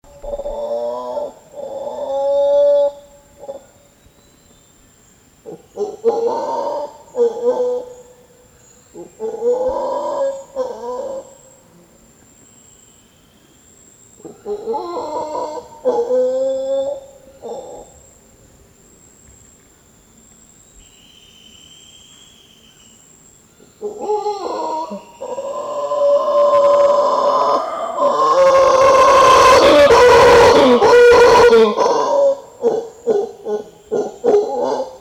mantled howler alouatta palliata, zvuki revuna obezyany